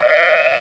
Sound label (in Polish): owca